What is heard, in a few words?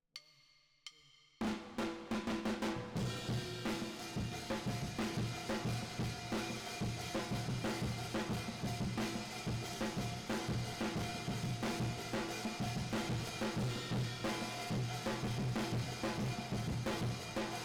Drums Verb